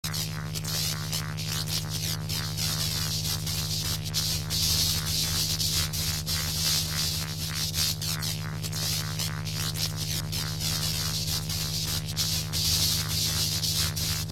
Sci fi energy generator loopable constant humming

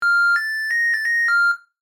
Dishwasher cycle end jingle; appliances

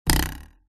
Cartoon twang ruler wobbling; boing

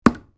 Dartboard impact dart hit; Darts

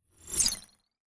Futuristic whoosh transition

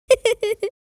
Little boy laughing

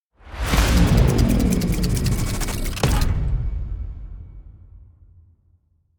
Cinematic time freeze slow motion clock

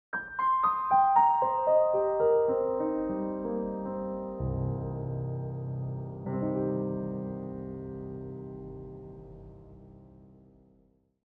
Game over you lose sad dramatic

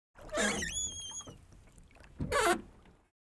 Boat interior door opening or closing

